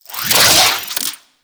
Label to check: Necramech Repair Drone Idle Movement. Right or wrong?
Right